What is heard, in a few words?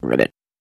Frog Ribbit Deep Fr; animals wild frogs